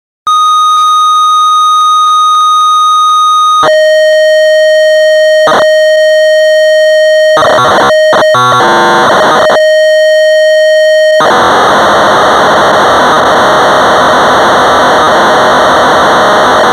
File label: Song Seq, ensoniq